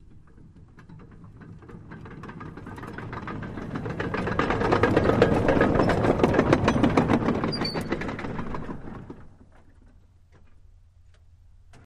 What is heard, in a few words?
Dozer By, Down Hill Without Engine, Tread Clanks